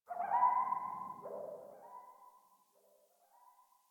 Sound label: Exterior Distant Calls, Ambience; Warframe; Duviri